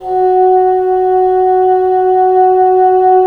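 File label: WND BN, wind bassoon; LA Composer vol. 3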